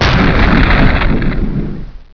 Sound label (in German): feuerfalle; hurrican